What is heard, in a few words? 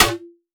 block